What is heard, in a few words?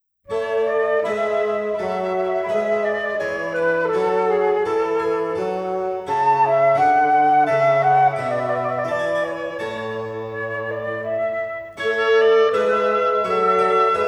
Quartet in A; Telemann; TWV42-a2